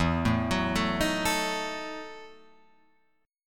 E Major 7th Suspended 2nd Suspended 4th chord, Guitar Open F; EM7sus2sus4 arpeggiated